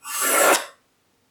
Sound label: draw line